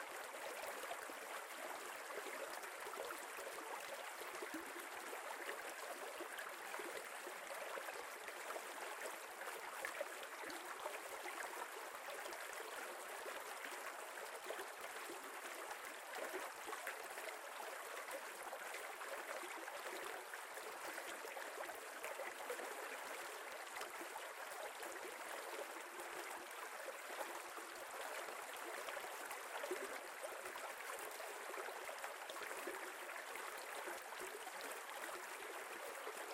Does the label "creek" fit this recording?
Yes